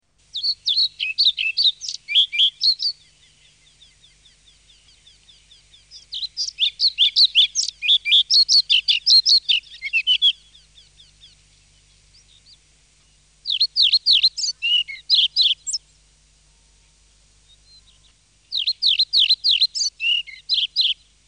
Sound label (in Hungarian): Kormos lйgykapу; Madarak